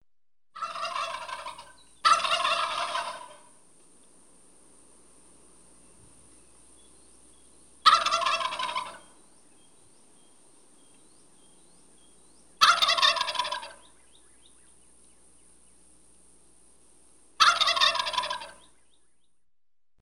dikij indyuk krichit

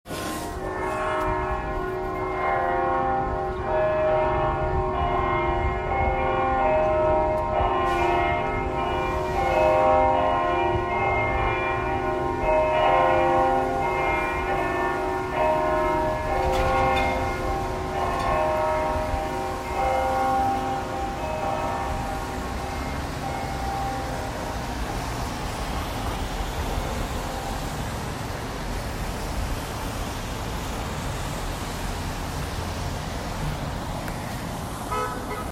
cerkovnie kolokola na prospekte

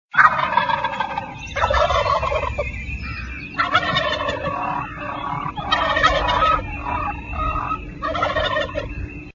indeika na ulice zvonkii massovii

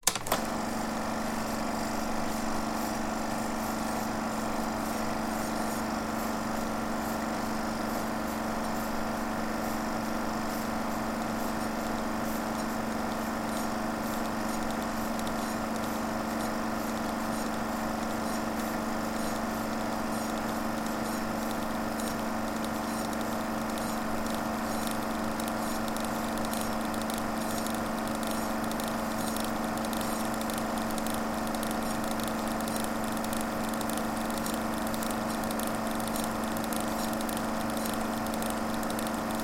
kinoproektor treshit

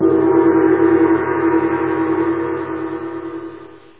gong odinochnij metallicheskiij shumnij otkritij

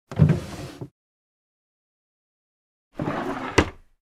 yashik stola derevyannij otkrili zakrili

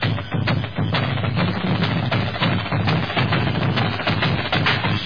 solo na barabanah melodiya shumnaya

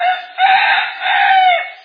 krik petuha nadrivayushimsya golosom

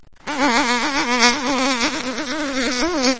komar blizkii zvonkii chetkii

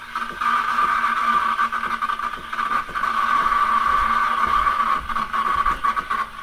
prigotovlenie kofe

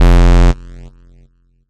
ejsid haus odinochnij dolgij

